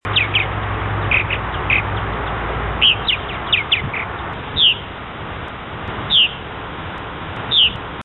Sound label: purple martin; Birds; Swallows